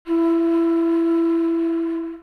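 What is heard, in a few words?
alto flute E5; dsp2008 sound library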